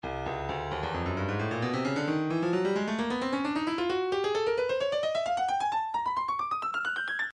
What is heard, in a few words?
breakbeat midi files 130 139 bpm 132; Stylus